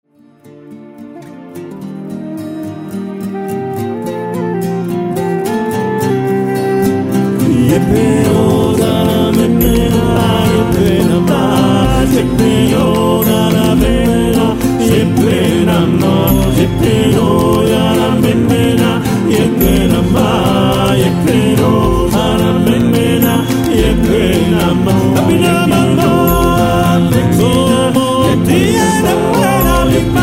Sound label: kwazulu melody